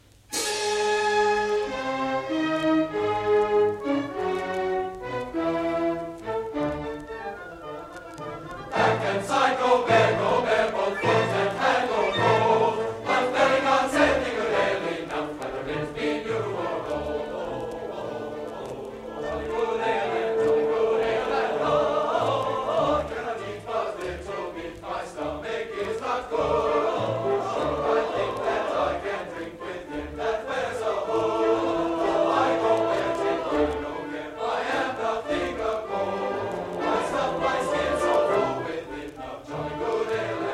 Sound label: Drinking Song; ISOMATA; In Windsor Forest